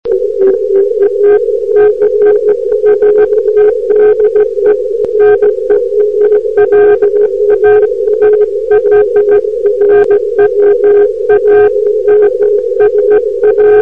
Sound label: CQ; eme